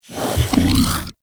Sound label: Vocal Breathe Fast; Warframe; Hunting Critters; Undazoa